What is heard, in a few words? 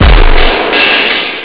drum loop; awe32